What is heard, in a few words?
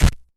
crackles, Vinyl